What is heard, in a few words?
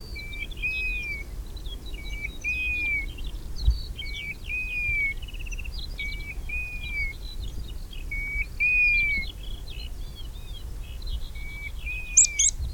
Golden Plover; Allendale Common; non passerines